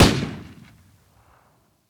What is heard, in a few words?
Helicopter Attack; Enemy Swat Helicopter Attack